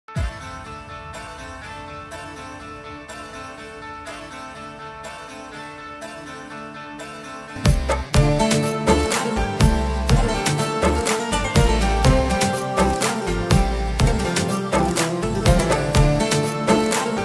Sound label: BGM ringtone